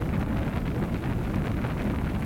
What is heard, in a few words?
yellow flame; hiddencoinsofegypt